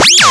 beep

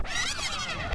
scratch

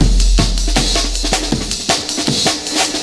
breakbeats

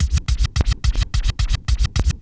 scratch

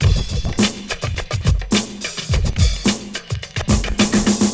kick beats

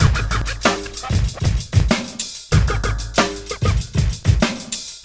hiphop

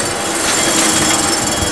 nature

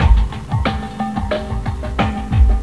Ambi Groove

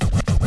scratch

